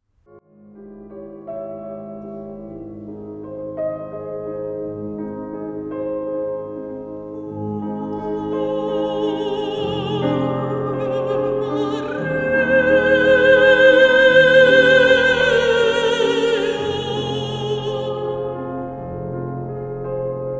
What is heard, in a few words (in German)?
Ave Maria mit Gesang Kirche